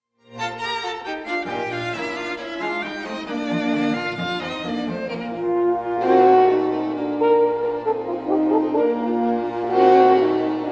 sextet for horns and string quartet allegro con brio; ludwig van beethoven; 2 horns; op 81b; 1st mvt